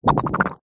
cartoon squelch

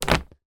foley string lose thick down on surface; zapsplat

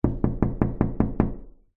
household window person knocking on window

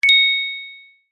multimedia notification bell chime ping med decay

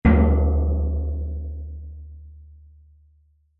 impacts metal hit drum gong like

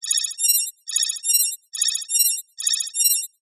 science fiction computer scanning tone

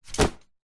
foley luggage rucksack backpack drop concrete

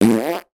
human blow raspberry

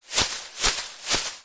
plastic bag thin empty shaking open up; foley

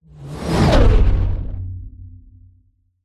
sound design whoosh powerful into drone; zapsplat

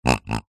pig grunt designed; animals

